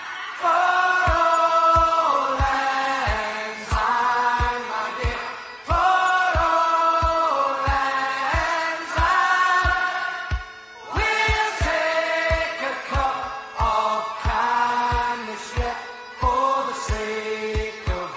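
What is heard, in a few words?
auld lang syne, ringtone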